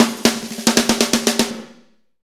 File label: Fast Shuff, Drumscapes Roland; Northstar